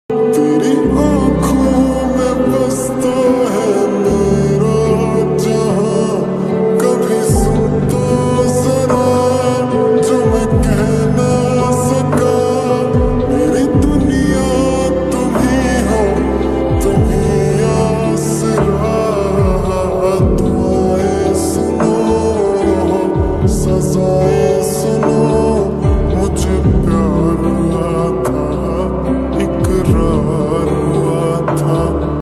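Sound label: slowed reverb hindi sad version lofi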